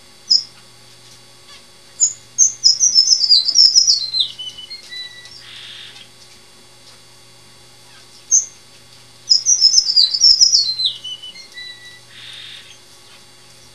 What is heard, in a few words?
Strawberry Song, finch; Birds